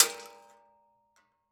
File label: Weapon Bullet Shell 12 Gauge Shells Drop On Metal Surface; AdobeCC